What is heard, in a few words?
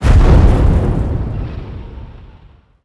pod crash